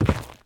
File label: zombie footstep wood; DeadFright